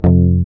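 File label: GUI F, acoustic guitar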